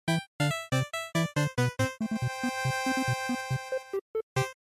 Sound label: NES Shooter Game Over